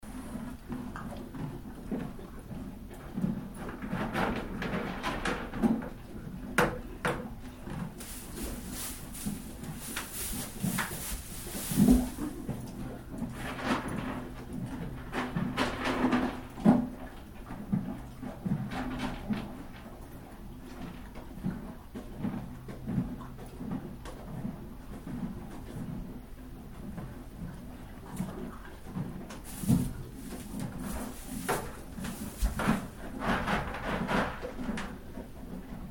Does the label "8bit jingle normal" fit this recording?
No